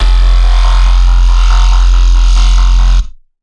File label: synth bass bright filter; multisampled; synth keys